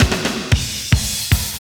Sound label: Loop, Roland; Sound & Vision - Gigapack I